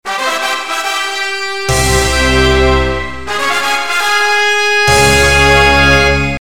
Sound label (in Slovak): Fanfara Klasika buum